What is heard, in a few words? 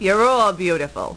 ouch; 3D Klondike 1.0a